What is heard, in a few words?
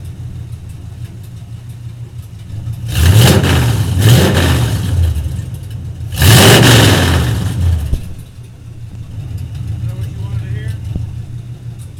3000rpm rev